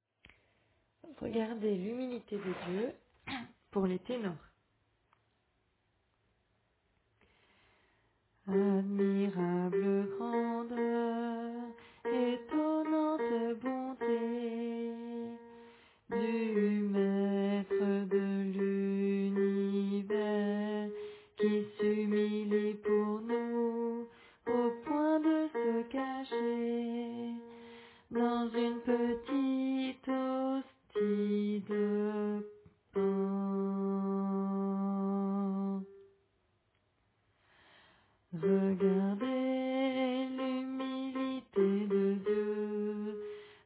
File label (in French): Tenor